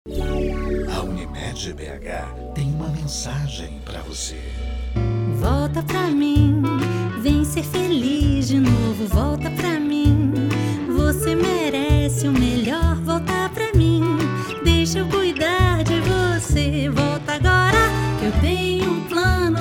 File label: jingle